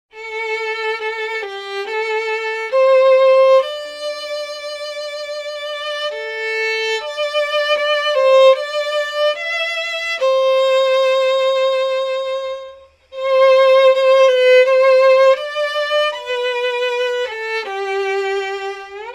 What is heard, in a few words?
Solo Hebrew Melody; Traditional Hebrew Melody